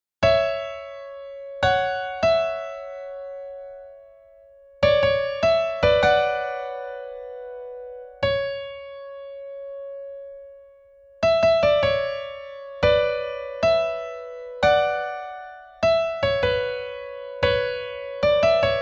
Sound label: balafon 300bpm piano; conus textile lightbox thresh strip dots